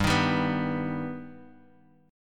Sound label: G Augmented G Guitar DADGAD